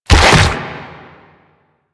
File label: Proto Infested Mutalist Speaker End Flesh; Warframe